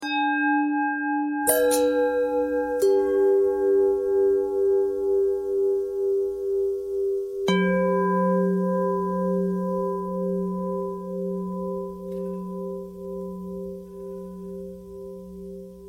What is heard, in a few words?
long bell bell thread noise